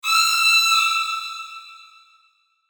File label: Vocal High Digital Yell; Production Element Imaging Element Accent Transition; Monsters; SPECIAL FX; Blastwave